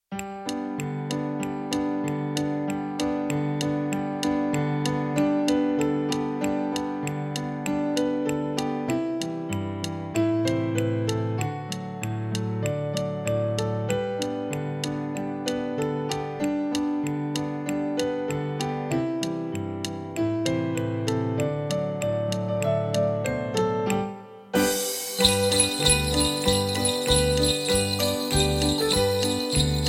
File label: Jingle Bells Playback